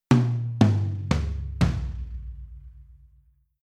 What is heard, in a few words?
Shuffle Tom, Rhythm